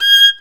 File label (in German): GEIGE; Best Service; Alpine Volksmusik